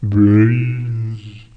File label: spooky, notes